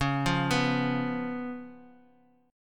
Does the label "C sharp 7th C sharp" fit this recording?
Yes